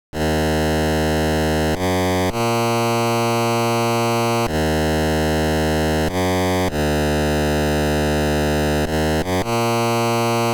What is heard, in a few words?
Game Over; Planet Smashers; Atari 7800